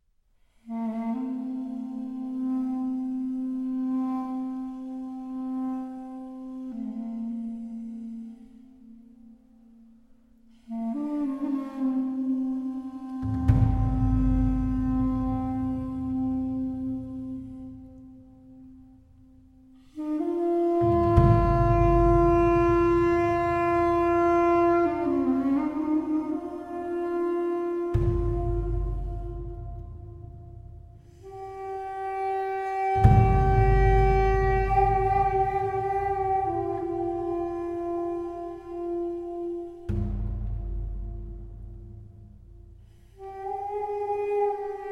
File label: instrumental lament